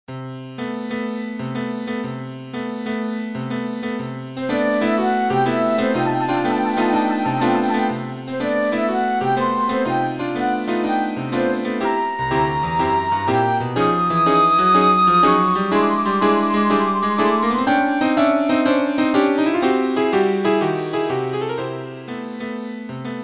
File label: Suite for flute and piano; DW Solomons